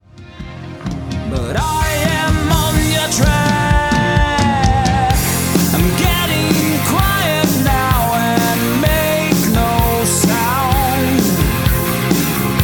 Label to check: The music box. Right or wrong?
Right